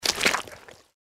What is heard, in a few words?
giant rat jump; npc